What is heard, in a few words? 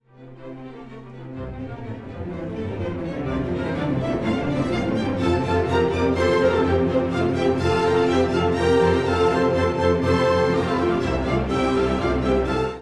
Orch